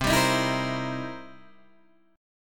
C 7th Sharp 9th, Guitar, Open D

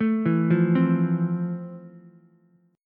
F Major 7th F, Ukulele; arpeggiated; JGuitar

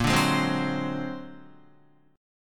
Bb Augmented 9th Bb, Guitar; 5,5,8,5,6,8; Bb+9 strummed; JGuitar

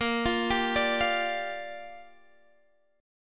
A sharp 6th, Guitar Standard; A#6 arpeggiated

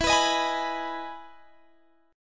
E Major 7th E down; EM7 strummed

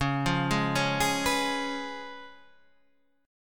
C sharp 7th, C sharp, down

